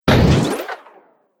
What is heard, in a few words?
Heavy Space Metal Impact; Miscellaneous Impact Sound Effects